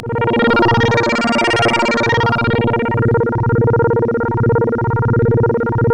A#, Synclav FM; Big Fish Audio; SPACE1

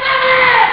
"dyeah"; Amiga Soundtracker sample

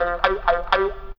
GUITAR

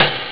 RING CLICK; Amiga Soundtracker Sample Packs

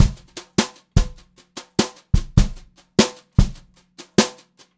Brushed Drum Loops, JAZZY; USB Soundscan vol.39; AKAI; 90 s Sample CDs

